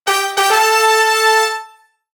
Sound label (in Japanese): ファンファーレ; ドラえもん四次元ポケット秘密道具; ドラえもんがひみつ道具を出しそうなジングル Vr2